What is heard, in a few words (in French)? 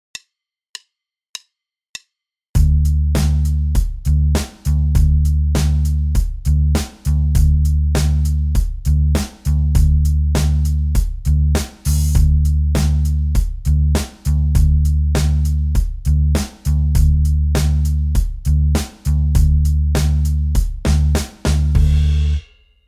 contretemps et syncopes binaires tempo 100